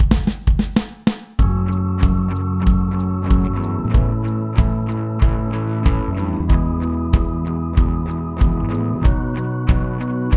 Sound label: Crashing Waves